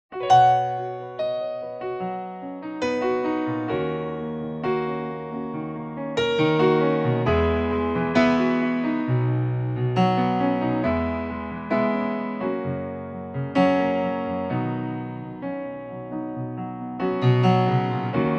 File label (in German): sängerin Pianoplayback B-Dur